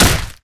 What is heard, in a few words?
hit flesh; zoochosis; deep sea; lazynap